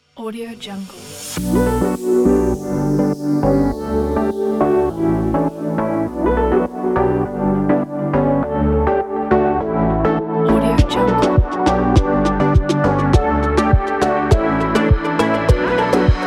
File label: summer pop